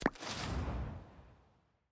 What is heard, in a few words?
click bubble